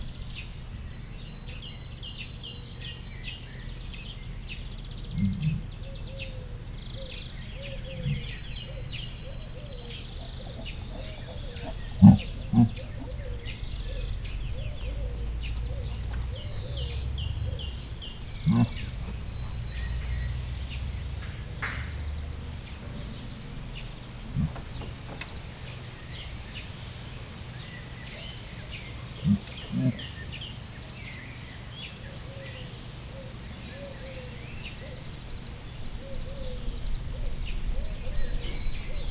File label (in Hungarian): több rövidebb hangjel, leopárd; jaszberenyi zoo 2015